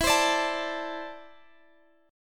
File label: E 7th Suspended 4th Sharp 5th E